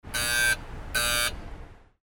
Buzzer Alarm

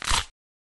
neck breaking

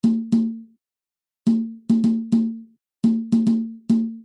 african tribal circle

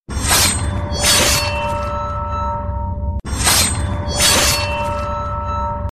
ninja blade

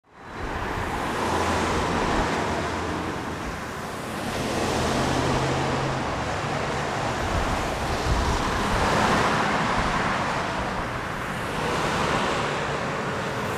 street traffic

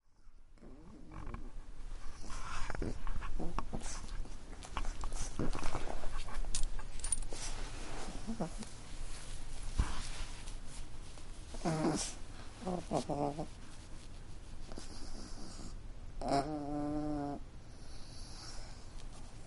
animal

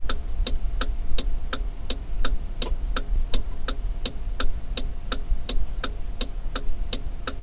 emergency flashers

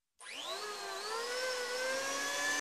tools electric plane starting

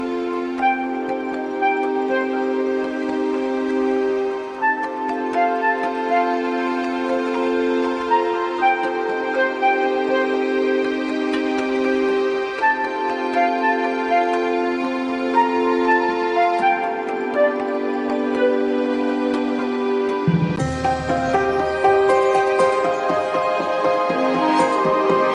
ringtones; frozen

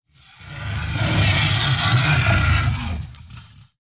ANIMAL CREATURE

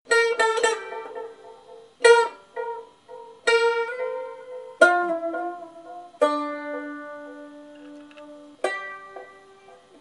bandolin

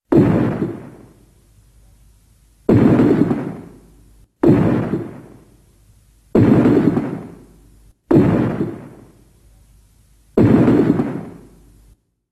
godzilla walking

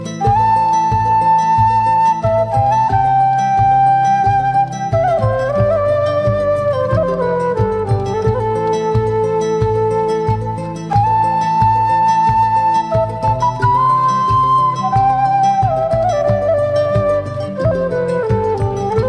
quena ringtones

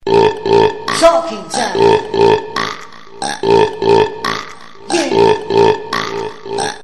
hip hop

